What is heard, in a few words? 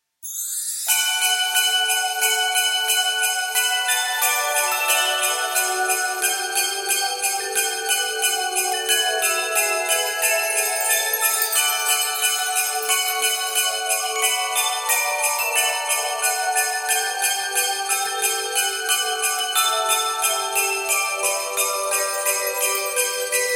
JINGLE BELLS SLEIGH BELLS